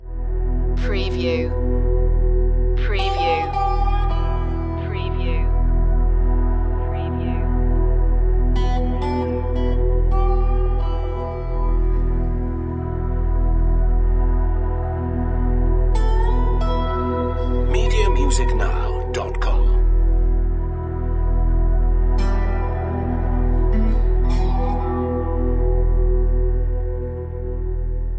twangland

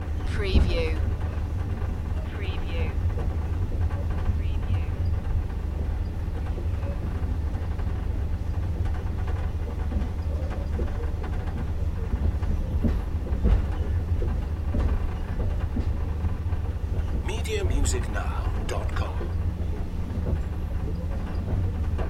sleeper train ambience loop; Vehicle / Transport Sounds / Trains / Subway Sounds

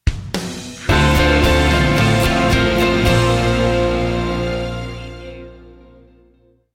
hold me tightly stinger

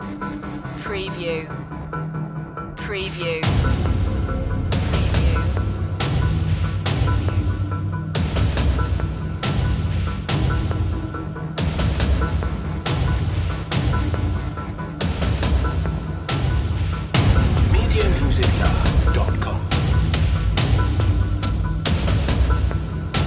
calming storm

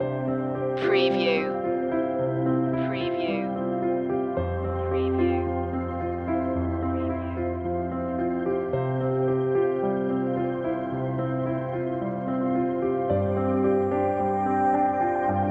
look up loop

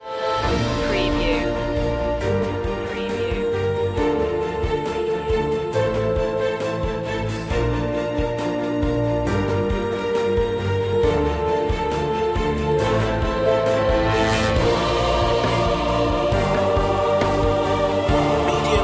the fountain of life with choir

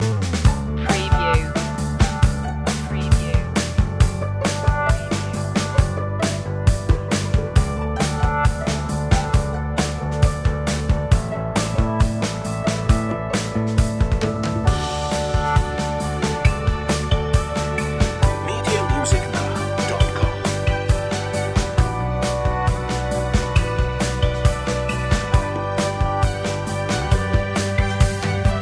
positive energy; self confident

